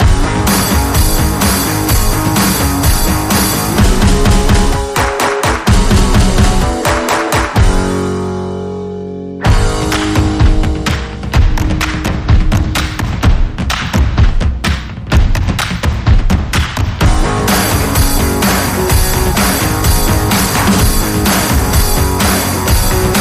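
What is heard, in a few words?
No Lead No Vocals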